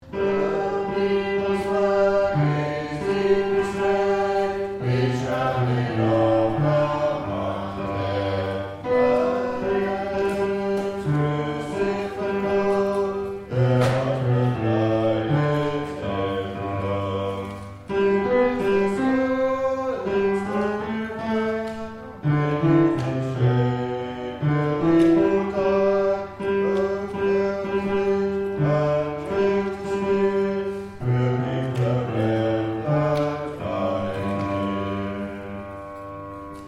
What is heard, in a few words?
Bass